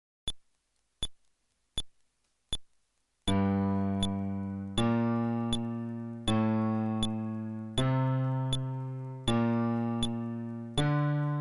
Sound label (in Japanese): フレットレス (テンポ80)